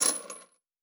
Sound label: Chain; Fantasy Sounds Bundle; Craft